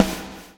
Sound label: BLOW; Spectrasonics - Backbeat